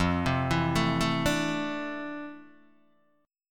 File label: F Major 13th Guitar Open D